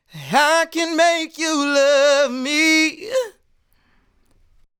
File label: Sung Phrase Dry, 70 BPM G# Min; Cymatics; Euphoria Vocal Sample Pack